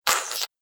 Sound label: Nasty Little Monster